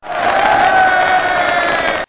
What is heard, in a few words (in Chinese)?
音效-欢呼